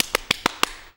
MULTCLAP, MULTICLAPS; Best Service; World Colours

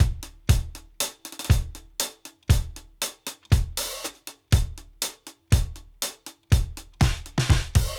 Drums, 120 bpm; LOOPS; BASEMENT DRUMS VOL.1

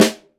Snare f; Digital Sound Factory Virtuoso

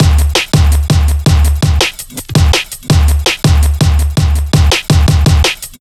165, drum loops, drum & bass; Total Drum&Bass

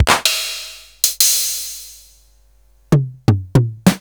Fricke MFB 512

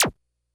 Bleep A, Drum Machines, Korg DS-10

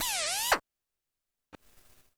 percussion, Roland TD-20

